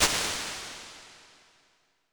Clean Room; Large Spaces; Rooms; Impulse Responses; Apple

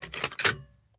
Sticky Keys ON; accessibility; CoreAudio; SystemSounds

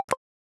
unlock; SecurityInterface

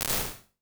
Flat Gate; Small Spaces; Apple

